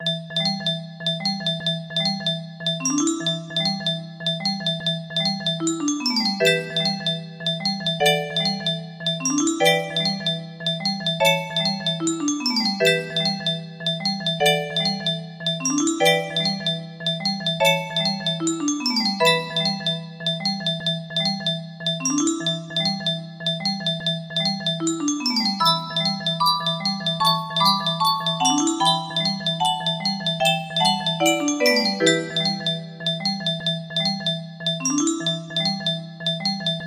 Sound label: music box melody